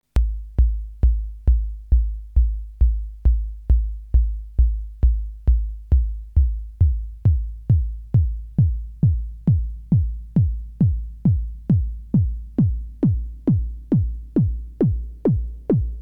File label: kicks; neptune; spectral